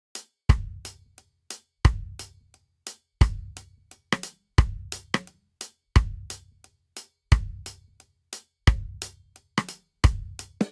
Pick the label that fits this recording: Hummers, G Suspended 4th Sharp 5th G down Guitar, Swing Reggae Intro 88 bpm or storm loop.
Swing Reggae Intro 88 bpm